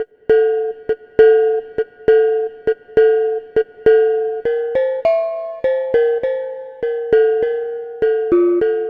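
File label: Pelog Bonang Melody; Melodic; Ambient-Melodics-II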